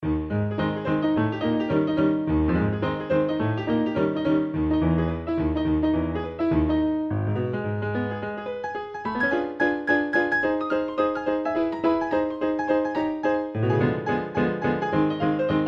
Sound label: piano